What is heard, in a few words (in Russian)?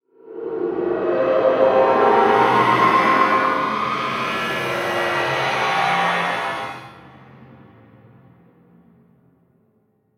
zvukovoj effekt uzhasov na hellouin paranormalnoe yavlenie